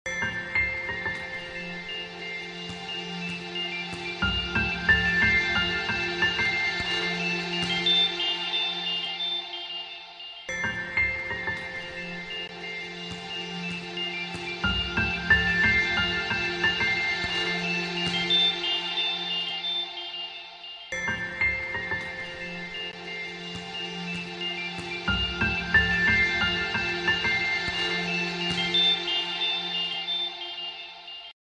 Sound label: song background music ringtone